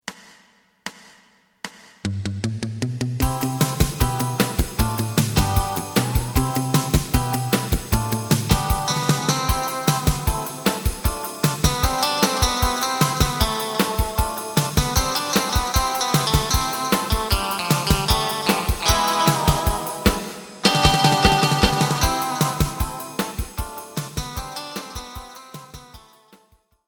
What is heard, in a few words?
bass